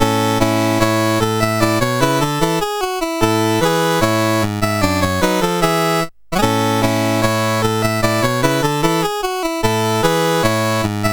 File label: menus, sid CSG8580R5; Games Summer Edition